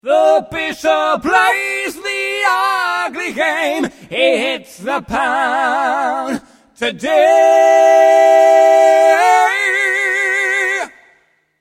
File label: 3rd High